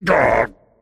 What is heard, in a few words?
pain; Pudge